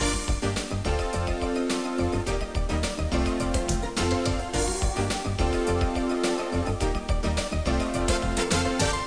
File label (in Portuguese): trilha; GEOGRAFIA